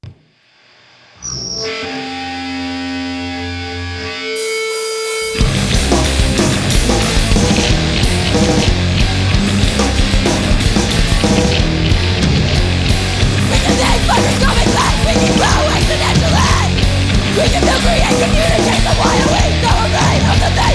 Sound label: Riff Limit